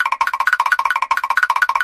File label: mr krabs walking